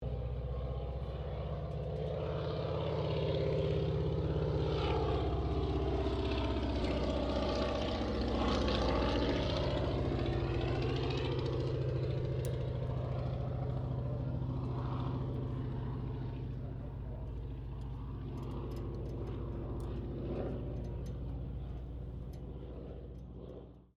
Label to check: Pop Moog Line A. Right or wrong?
Wrong